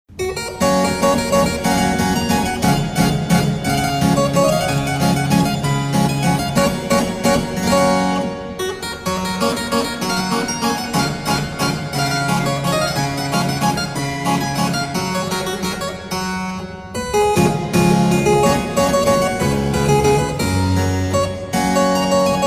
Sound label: Kosciuszko Waltz